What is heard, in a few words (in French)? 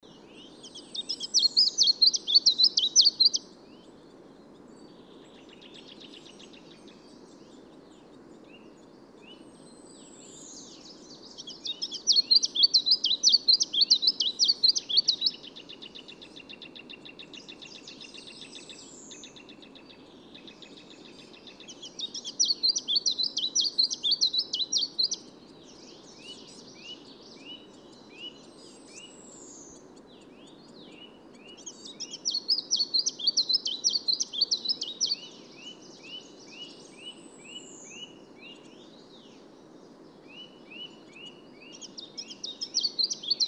pouillot chant